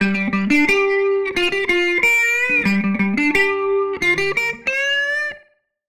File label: Guitar line, 90bpm, hiphop; musicradar